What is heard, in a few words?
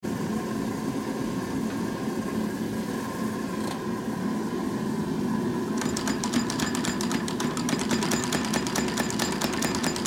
small factory